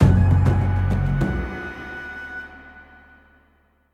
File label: outro Free Spin; netent; longpao